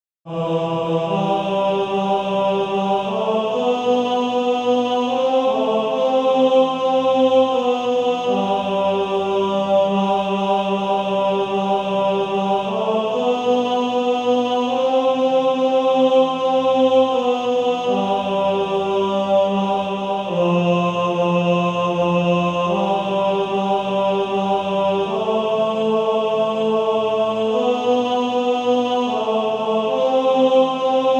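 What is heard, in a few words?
Male Choir, tenor 2; Infant Holy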